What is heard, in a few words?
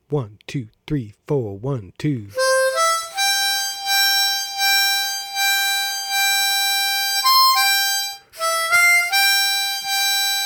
Fast; The Marines Hymn